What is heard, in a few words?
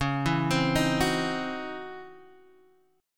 C sharp Minor Major 11th, Guitar, Drop D